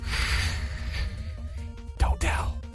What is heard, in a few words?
shhhhh